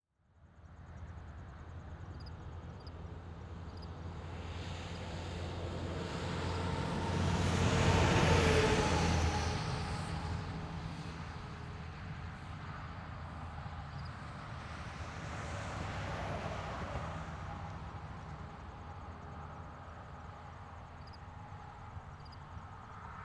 Ambience Traffic Two Lane Road Light Traffic; AdobeCC

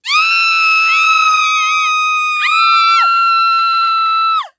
Horror Scary Human Female Scream Group Scream

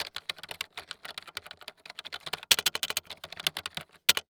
Electronic Keyboard Computer Keyboard Typing; AdobeCC

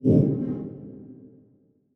Transition Hit Impact Short; Production Element

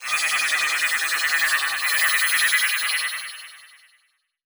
Transition Communication Distortion Scanner; Adobe; Production Element

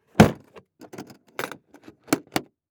Industry Tool Box Close Lid

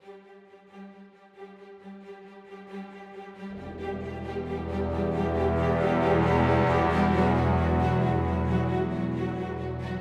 Epic 135BPM, Cinematic Trailer Music; BlueFX; Epic Soundtracks

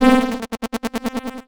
Title Transition Delay Short; Adobe; Production Element

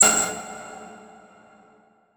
Transition Hit Impact Ping; Production Element

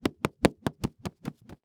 Staff Hit Body Multiple Rapid Fire, weapons; AdobeCC

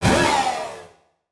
Flash Blast; Multimedia Internet CD-Rom Flash

